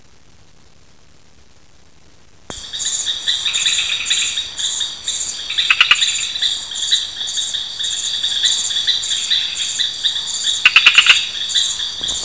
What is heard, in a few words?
Gephyromantis salegy; M luteus